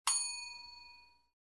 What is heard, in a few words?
timer bell